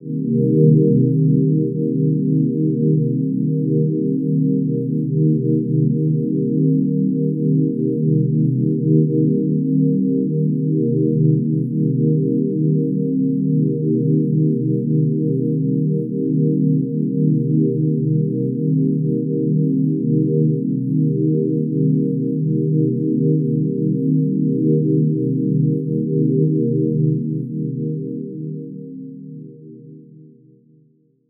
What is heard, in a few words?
Pad C2, club techno